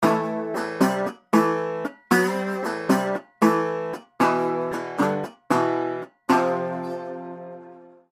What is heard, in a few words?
Acoustic